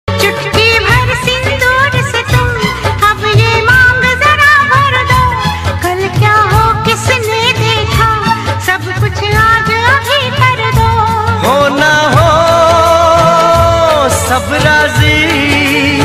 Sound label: hindi ringtone